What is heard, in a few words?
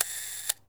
Camera